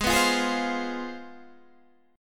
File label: C Major 7th Suspended 4th Sharp 5th, Ab, chord